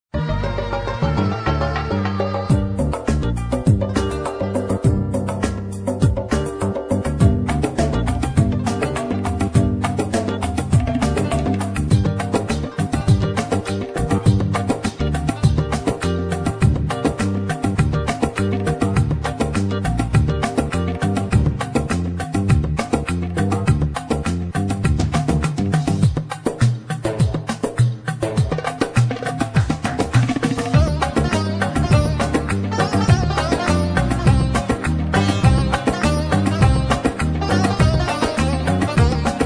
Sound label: india pop; indish; pa80; korg